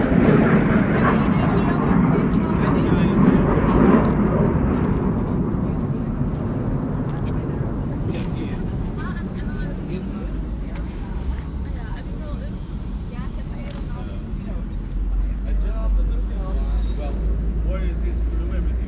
Waiting For The Ferry While Jets Fly Overhead, Porto